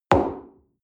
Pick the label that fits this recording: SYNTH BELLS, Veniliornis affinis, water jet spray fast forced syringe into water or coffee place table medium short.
coffee place table medium short